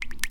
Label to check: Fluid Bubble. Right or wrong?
Right